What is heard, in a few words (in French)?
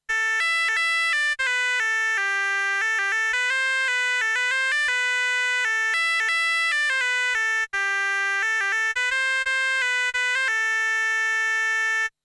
MS-20 bombarde; réaliser un formant : la bombarde bretonne; Démo audio des fiches didactiques de sons analogiques détaillées dans le livre